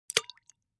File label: Splash Sml Plop Drink